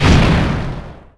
land hit; Splashdown; XBOX; Impact

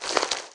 Mutant Slither; Valleakan Mutant 1; Aliens

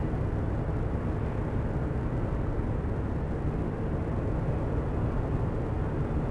mine core ambience; Star Wars Obi Wan; XBOX

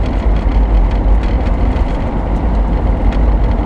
Grass Surface; Totaled; XBOX

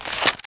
Mach Gun Equip, Machine Gun

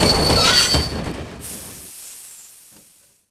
Train Stops; ambient; subway

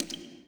drip

